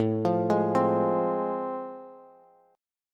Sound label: A Minor Major 7th Sharp 5th A Bass, chord; AmM7#5 arpeggiated; 17,20,18,17